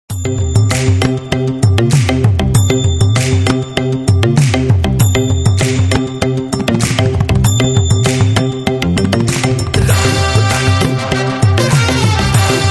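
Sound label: background music Ringtone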